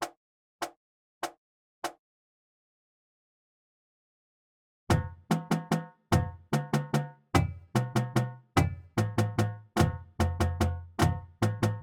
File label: Flams; Drumline Warmups